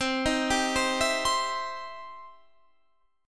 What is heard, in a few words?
E Minor Major 7th Sharp 5th C